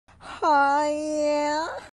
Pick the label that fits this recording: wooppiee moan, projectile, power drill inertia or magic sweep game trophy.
wooppiee moan